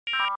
Descending 4 Tone Beep, beeps

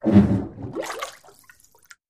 Bathtub Fart Boomi; farts

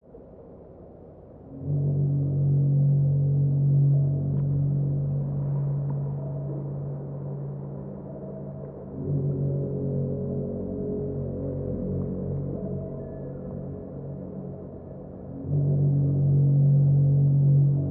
Horn Ship Fog Melo

